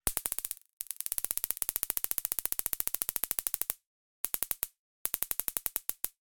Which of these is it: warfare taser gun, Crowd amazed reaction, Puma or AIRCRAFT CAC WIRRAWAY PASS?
warfare taser gun